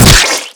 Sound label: antlion shoot; npc